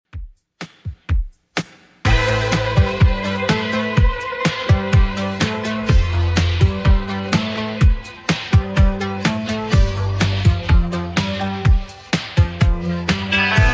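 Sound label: 80s 125bpm; Redrum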